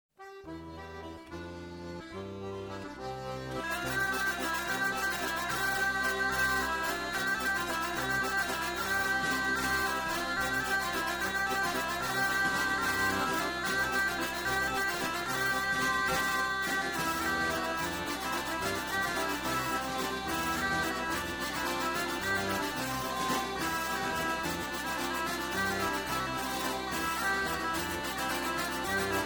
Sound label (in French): bourree; chiddes